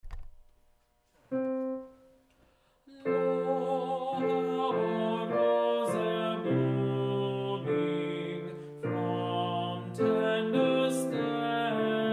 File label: Tenor; Lo, How a Rose E’er Blooming, M. Praetorius; Advent